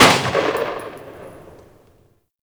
Lever Action Pistol Tail; Warframe; Grineer

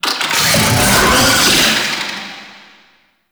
Summon Vocal Verb; Warframe; Uriel; Catenach

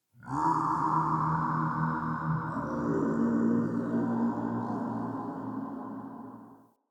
Day Texture, Ambience; Glades Of Andurin

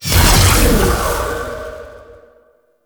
Noctua Alt Shot Fire

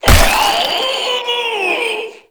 Inked Humanoid Death; Enemies; Warframe; Shadowgrapher

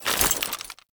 Bayonet Melee Whoosh Gear Accent; Warframe; Tenno

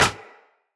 Bow Impact Default; Weapon Impacts; Player; Bows; Environment; Hunter; Envoy Hunter Bow

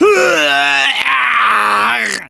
Death, Barks; Kuva Lich; Persona D

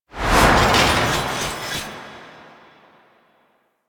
Arsenal Close; Interior; Ambience; Warframe; Nineteen Ninety Nine; Tailor Shop

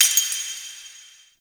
Metal Debris; Warframe; The New War; Zariman

